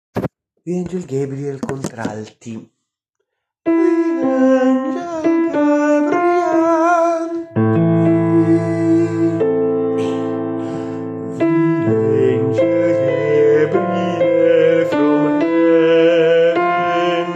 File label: alto; angel gabriel